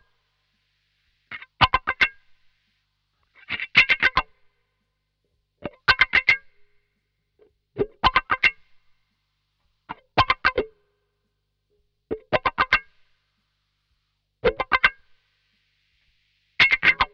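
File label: 112 C SUGAR FUNK guitar WAH, 112 BPM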